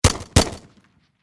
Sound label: Warfare Machine Gun HK416 Semi Double Shot Close Perspective; Hk416 Semi Double Shot Close Perspective Heckler Koch